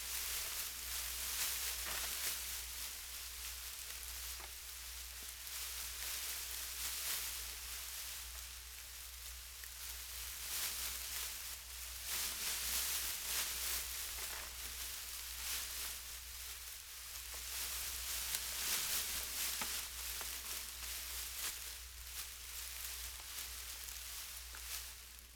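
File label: Grass Moving With The Wind; Fairlight Sound Lib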